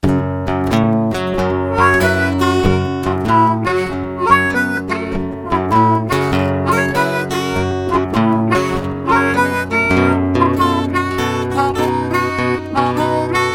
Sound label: delta blues